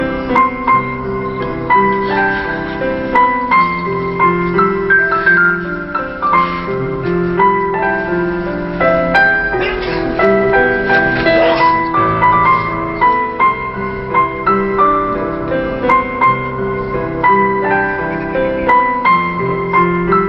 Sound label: Teenmaar Melody